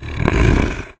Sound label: armadillo die; edgar; enemy